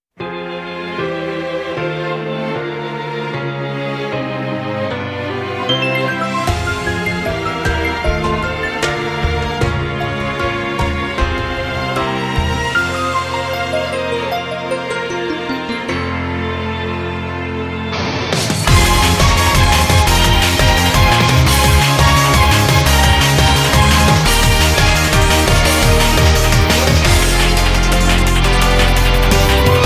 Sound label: Off Vocal